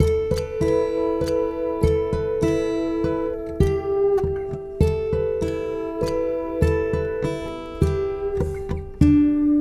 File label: Guitar And Synth; Sonic Foundry; Friendly Fire; Arkestras; RedTrees